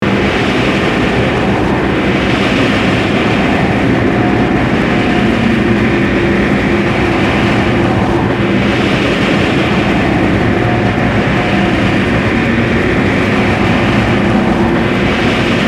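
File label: Ambience Decompression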